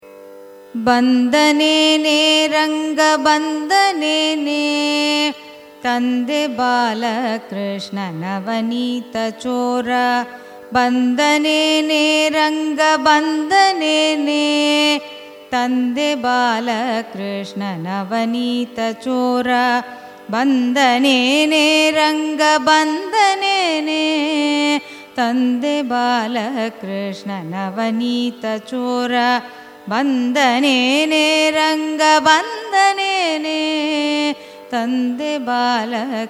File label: raga vasanthi carnatic; carnatic music